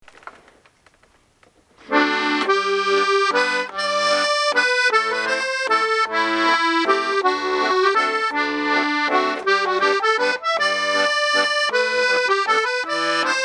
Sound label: waltz